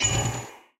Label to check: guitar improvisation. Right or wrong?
Wrong